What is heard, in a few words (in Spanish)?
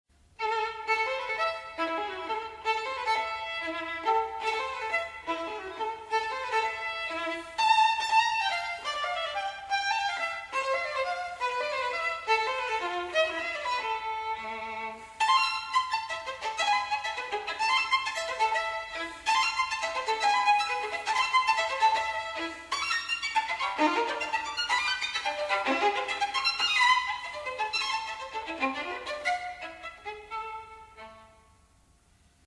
SONIDO DE VIOLIN